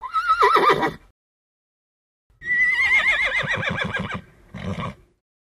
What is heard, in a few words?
Horse Whinny Excite; milling vocals